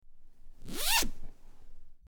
Zipper

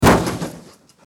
car crash

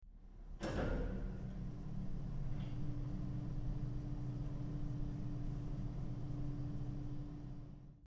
elevator hum

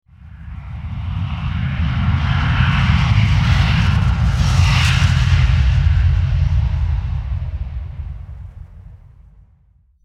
Airplane takeoff

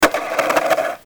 skateboard

